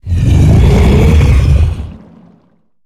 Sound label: Enter Play